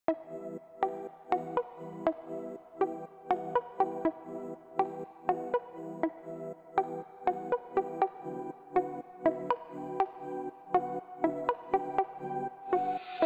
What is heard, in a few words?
beat